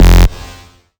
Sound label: Bass F, Bass Synth